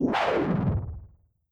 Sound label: beast roar more savage